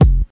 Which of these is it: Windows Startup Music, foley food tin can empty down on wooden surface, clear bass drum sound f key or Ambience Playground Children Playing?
clear bass drum sound f key